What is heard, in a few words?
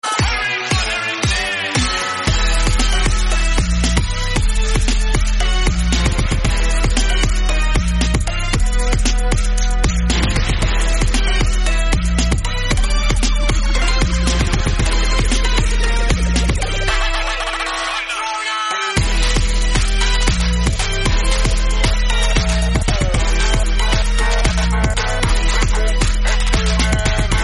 trap